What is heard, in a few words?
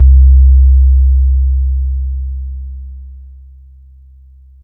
sub-stained, bass; Jungle